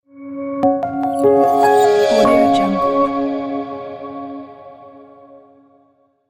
elegant logo intro

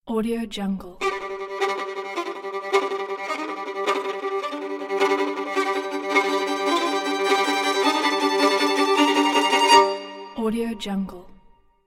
logo violin

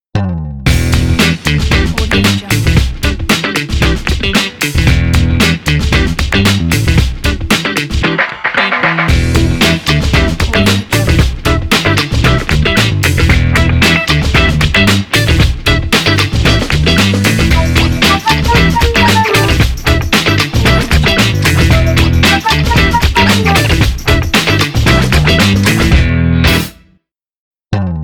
breakbeat hip hop funky logo

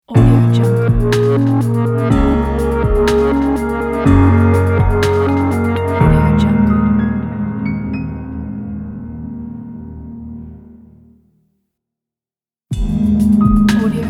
modern creative logo intro

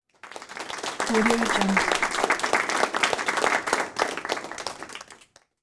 Polite Applause

